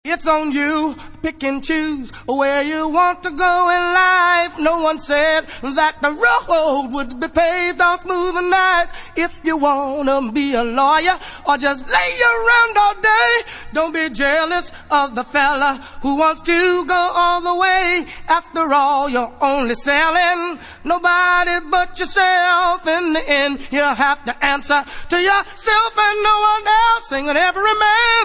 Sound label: original acappella version